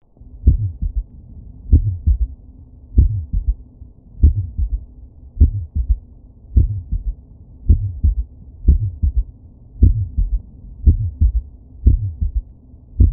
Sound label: Heart Sound